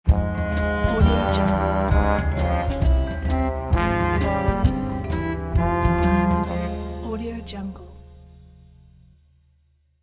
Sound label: cartoon opener logo